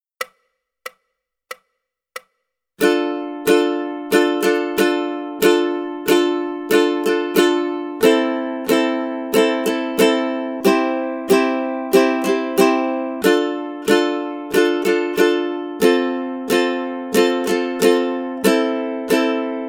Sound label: Rhythm Study, ukulele